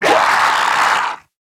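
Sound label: die, zombie male; nmr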